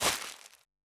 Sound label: footstep softwet; The Swapper